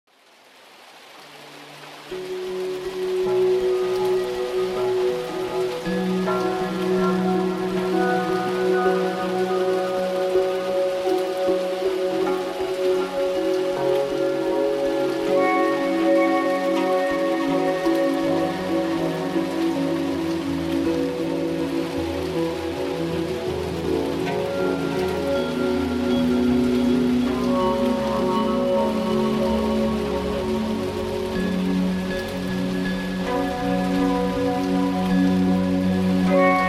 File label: Sounds of Nature Thunderstorm Rain